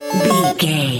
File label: collect item hurry up alarm warning